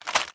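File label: equip movement; Valorant; phantom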